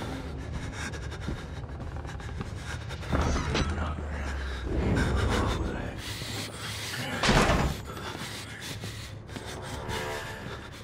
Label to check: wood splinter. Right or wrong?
Wrong